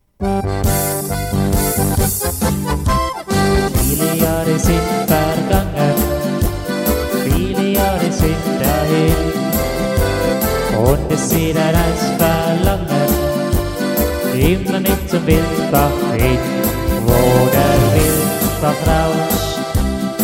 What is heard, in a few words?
polka